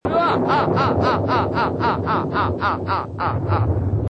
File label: Laugh